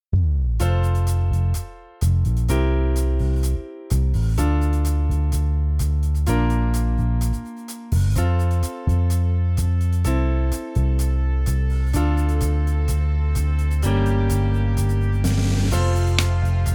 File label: Sing Along, rock